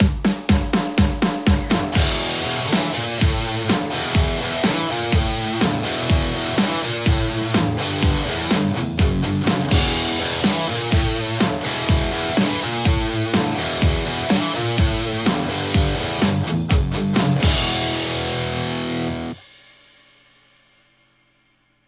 strat; tse x50v2

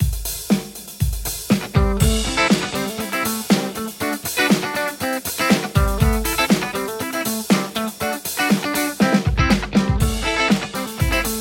les paul emissary; igniteamps

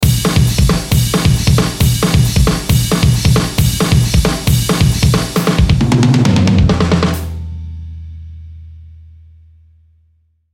drum pattern

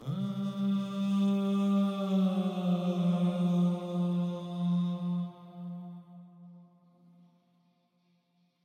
vocals back automation

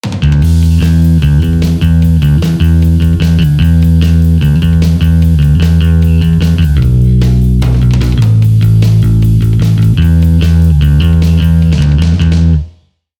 bass 8x12; softube

